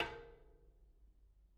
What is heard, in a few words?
Bicycle Spoke